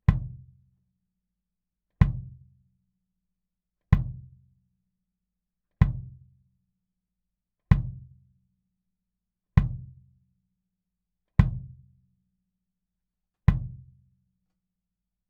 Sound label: Kick Pad